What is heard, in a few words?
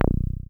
SYN 303 C2; Best Service Gigapack 1; SYNTB303